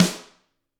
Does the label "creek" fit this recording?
No